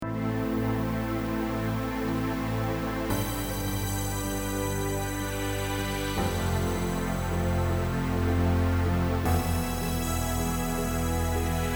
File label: Electronica